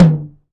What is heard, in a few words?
Tom Real, Drums